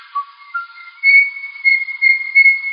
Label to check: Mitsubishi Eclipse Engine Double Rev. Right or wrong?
Wrong